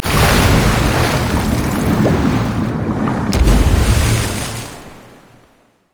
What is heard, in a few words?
tidal wave; nami base